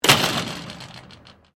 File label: Door pivot exterior aluminium flyscreen slam; Doors; Various doors; Miscellaneous Doors; SoundEffects Foundation2